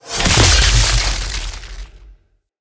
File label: Kuva Lich Requiem Mod Fail A, UI